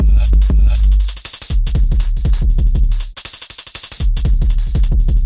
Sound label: gnarly distorted bass n hats, 181 bpm plus